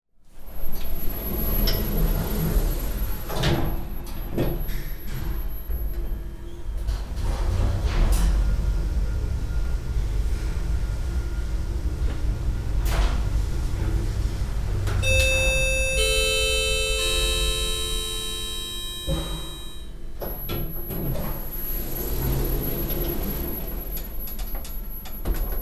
industrial elevator hotel doors open close ride stop tone